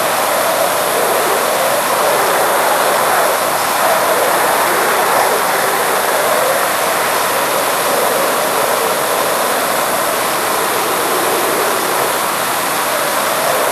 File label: Aircrafts L-39 AI25 Front; DCS